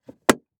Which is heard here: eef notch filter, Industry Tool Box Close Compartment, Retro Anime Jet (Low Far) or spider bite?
Industry Tool Box Close Compartment